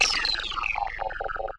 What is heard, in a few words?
Ring Mod, Cymbal, contact mic; Murf & Ring Mod